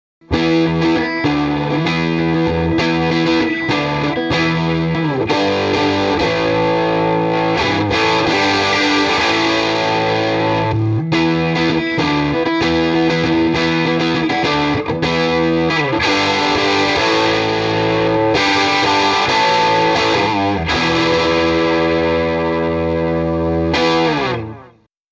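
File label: m75 lp neck pickup jtm45 distortion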